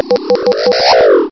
deedle; tech

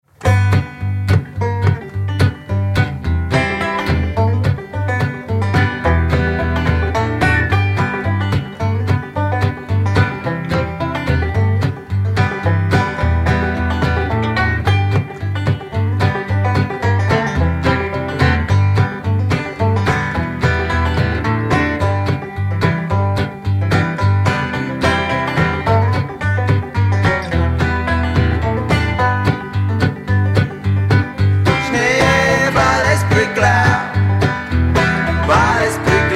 Appalachian Song

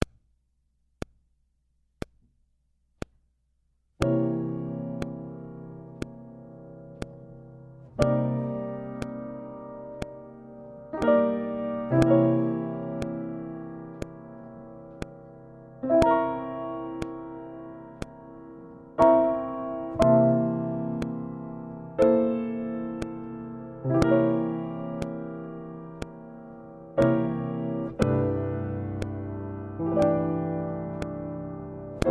jazz bass

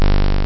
ELECTRIC

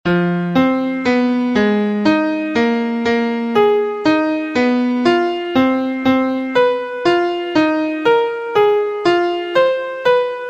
Blues Atomic Scale Key F

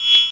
whing; tech

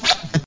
clunk

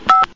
PHONE; office

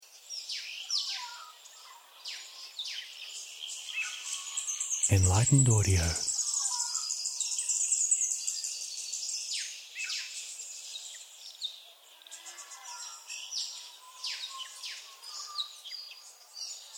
Birdsong In The Woods

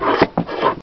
PAPER CUT, office

